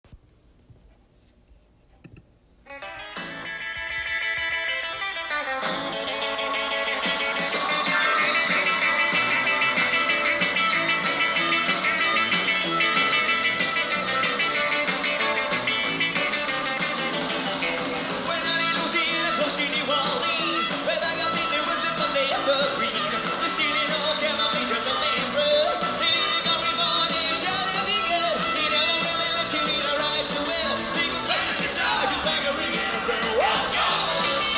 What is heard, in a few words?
Dance